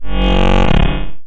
overload me, Bass

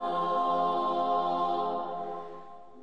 Slavic Choir Fm Ohh, Bulgarian Choir

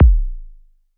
filter whack; Kicks; single hits

